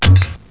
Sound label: FLUMP